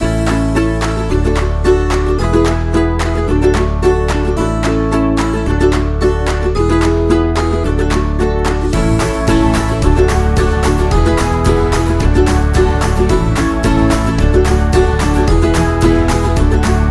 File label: Upbeat Loop Happy